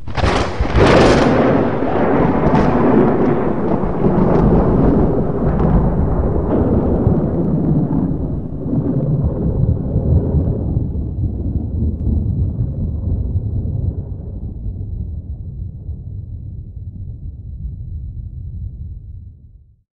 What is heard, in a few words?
thunderclap